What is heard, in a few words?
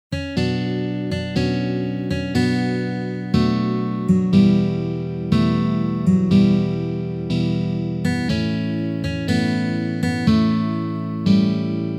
Guitar Chorus Slow Tempo